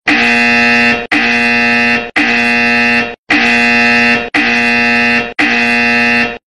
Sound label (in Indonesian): nada dering orderan masuk; Gojek